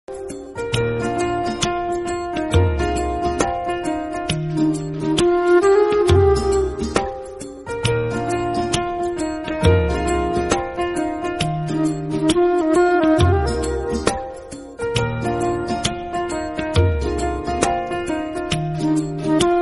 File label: INSTRUMENTAL RINGTONE